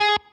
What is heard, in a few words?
Staccato